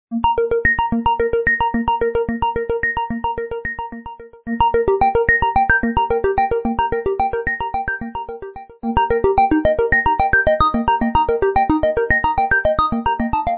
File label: ringtong; Gionee GN205